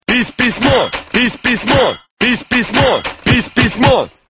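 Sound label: typewriter; Pis'-pis'-mo; pechatnaya mashinka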